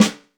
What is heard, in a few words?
JAZZ S, JAZZ KITS; Crypton; DrumBase5000